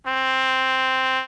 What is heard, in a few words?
trumpet c4